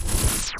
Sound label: reels start